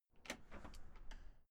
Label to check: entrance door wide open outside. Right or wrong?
Right